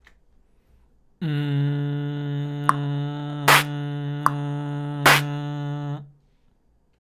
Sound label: HBB clap shita n